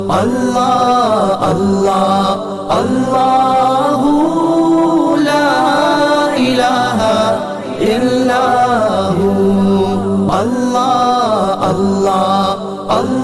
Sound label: LA ILAHA ILLALLAH, Islamic ringtone